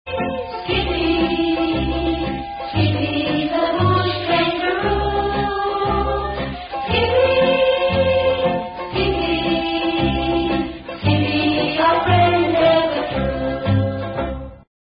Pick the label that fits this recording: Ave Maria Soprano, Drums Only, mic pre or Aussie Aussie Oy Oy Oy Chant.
Aussie Aussie Oy Oy Oy Chant